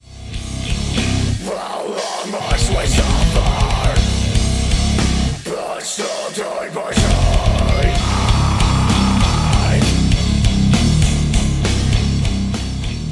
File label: metal sample